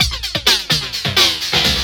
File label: drum loops; bb drum loops